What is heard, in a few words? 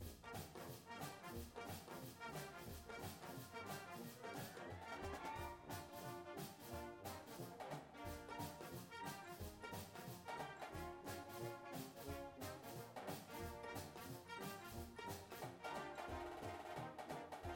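snare with music, drumline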